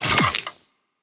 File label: Weapon Reload (Flamethrower)